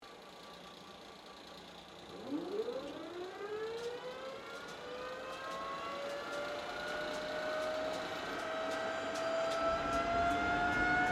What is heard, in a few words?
AIRCRAFT GLOSTER METEOR F MK8 STARTUP; Gloster Meteor figter jet; Airplane; Vehicles; Airplanes; Aircraft Collection; Twistedtracks